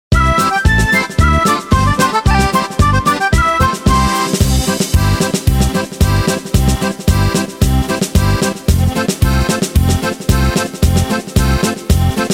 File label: treasure adventure karaoke